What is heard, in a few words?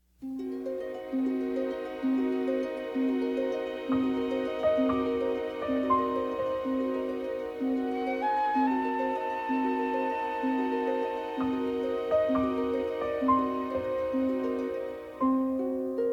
Sax's (w verb); New Sax's